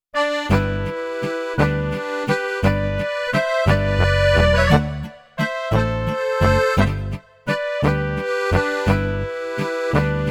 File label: MIDI Sound module waltz baritone, accordeons; SONATTER TM4